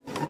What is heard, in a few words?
GUITAR FX; Xsample VOL-2